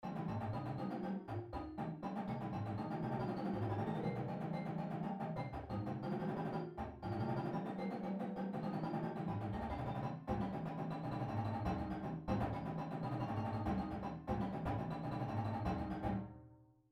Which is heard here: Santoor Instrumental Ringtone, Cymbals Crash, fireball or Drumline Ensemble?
Drumline Ensemble